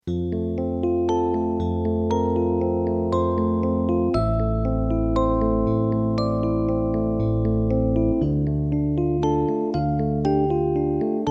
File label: supersweet rhodes